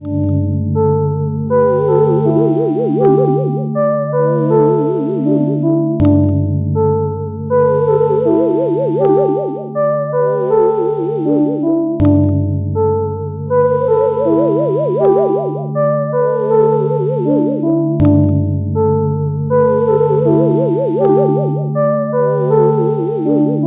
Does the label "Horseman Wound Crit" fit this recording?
No